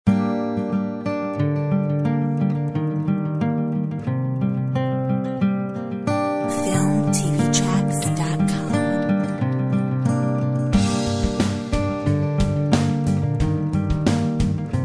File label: Acoustic echo